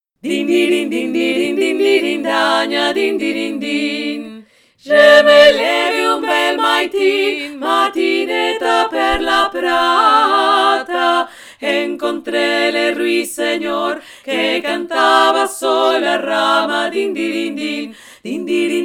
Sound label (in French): 3 VOIX; DINDIRINDIN